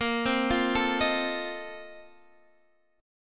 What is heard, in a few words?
A sharp Major 7th Suspended 2nd Suspended 4th A sharp Guitar Half Step Down